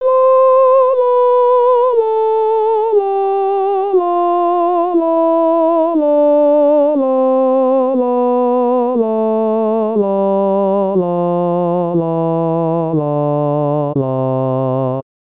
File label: Tenor Voice